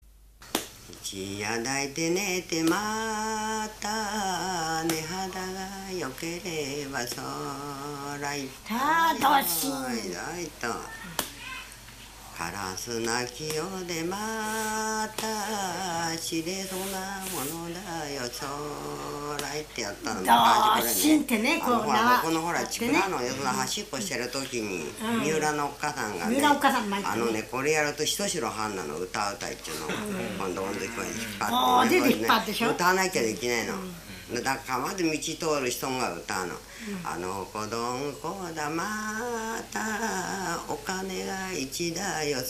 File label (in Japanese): kuiutiuta, minyou